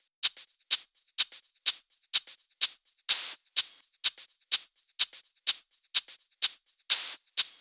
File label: Thai Kit 6, 126BPM; Vengeance Samples